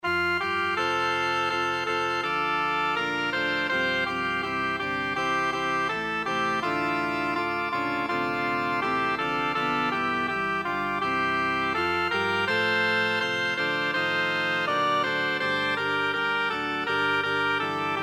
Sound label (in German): sopran; kaelin pierre; chante en mon coeur